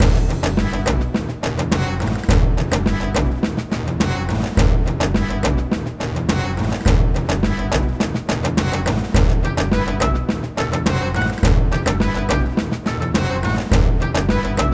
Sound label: Action; SPRay; Wii